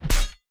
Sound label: sword impact